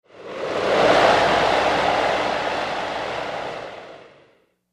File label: Ind Gust Wind; gusts